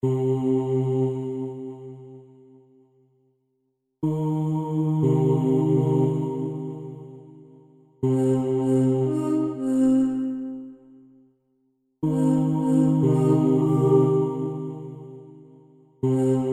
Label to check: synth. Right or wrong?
Right